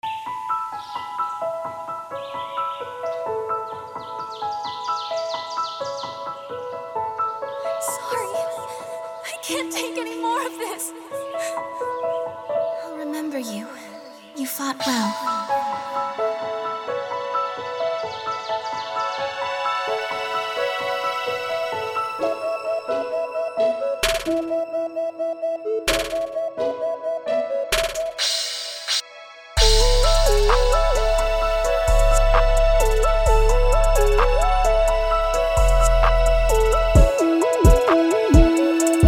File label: plugg loop